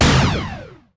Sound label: bullet psibolt; 星际争霸音效; 星际争霸其他